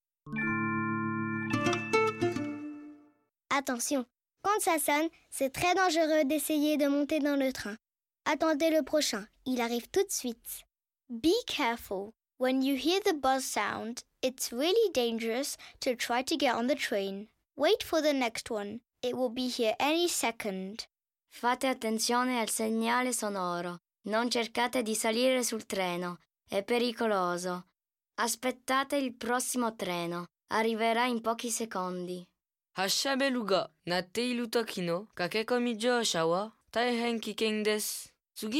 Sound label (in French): Signal sonore multi jingle